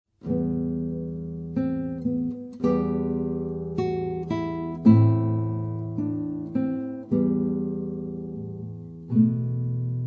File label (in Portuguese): adagio, guitarra classica